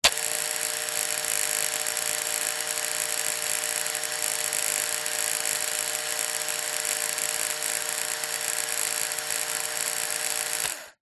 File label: household razor electric remington male operate run dry